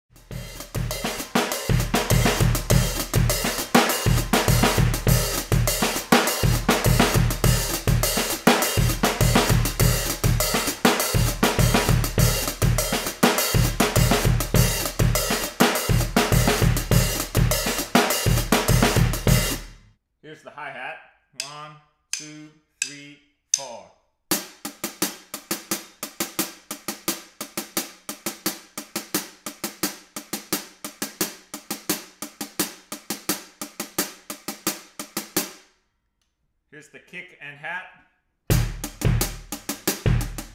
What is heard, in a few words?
basic timba beat for drum set; Сальса; Сальса под капотом, рабочая тетрадь